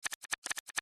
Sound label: deal sound; Game Sound